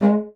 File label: bassoon G#3; C BASSOON; Xsample VOL-6